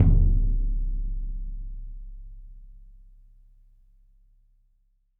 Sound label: Roland TD-20 Percussion